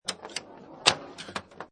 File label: Pinball Sound Ball Roll And Passing Switch And Spring